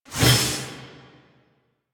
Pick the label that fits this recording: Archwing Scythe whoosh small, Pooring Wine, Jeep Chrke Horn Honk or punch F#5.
Archwing Scythe whoosh small